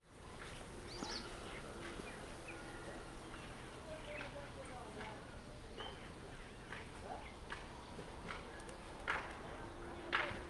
daytime small town backyard birds traffic in distance leaves in wind; sonniss gdc